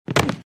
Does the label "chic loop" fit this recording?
No